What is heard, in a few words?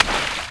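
splash, FOOT; The Elder Scrolls III - Morrowind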